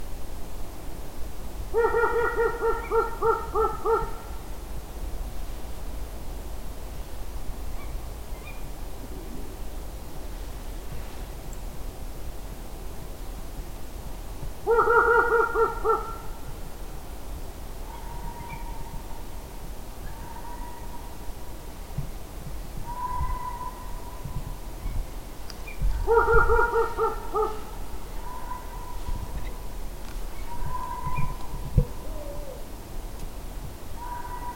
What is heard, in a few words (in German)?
Uhu Alarm